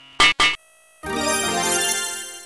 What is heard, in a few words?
UI refine success